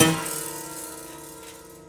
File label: Prepared Piano; Battery 3 Sample Kits